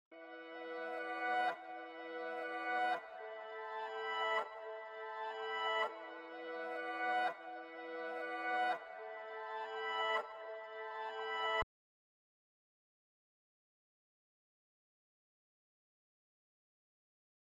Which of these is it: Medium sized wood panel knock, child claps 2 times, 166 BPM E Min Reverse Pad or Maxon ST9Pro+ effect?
166 BPM E Min Reverse Pad